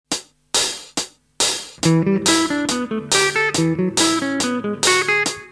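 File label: natural minor scale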